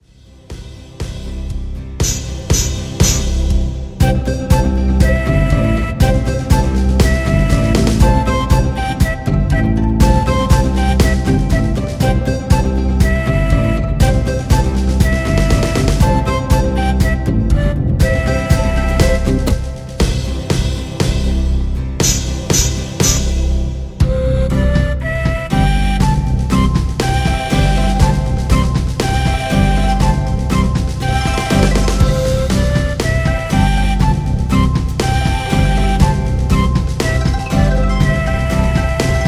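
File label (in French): Chinois, Version instrumentale avec ligne mélodique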